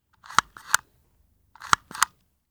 Hole Punch, Office hits; Nikko Barrera-Amaya

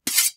sword Metal; Foley; Kenney's Sound Pack

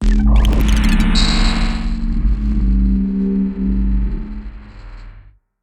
Sci-fi Cinematic SFX; Blik Blinks - SIGNUM

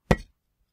stone hit; Kenney's Sound Pack; Foley Sounds; Rocks

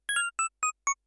power up; Kenney's Sound Pack; Digital Audio

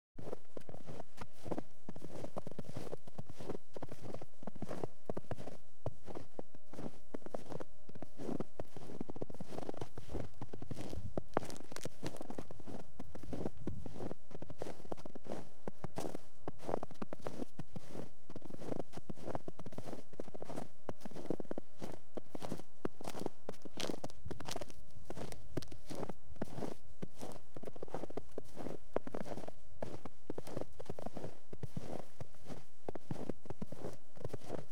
Footsteps, In Snow; Children Crowds Foots; BBC Sound Effects Library

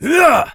Voices Male Attack Heavy; Funky Rustic; FunkyVoices

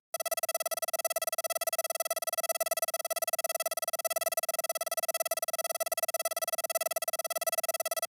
data processing, high tech interface sounds; Lesser Vibes

